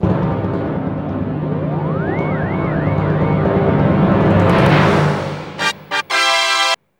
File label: Stardust Fanfare; Katamari Damacy; PS2